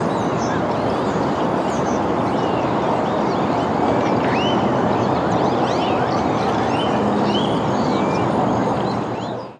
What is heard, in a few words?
Melbourne St Birds, birds; HOUSTON